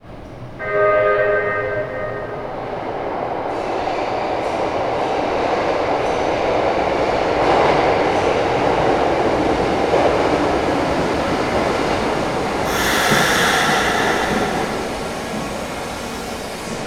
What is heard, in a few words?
SUBWAY